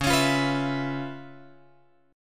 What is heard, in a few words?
C sharp 7th Sharp 9th, chord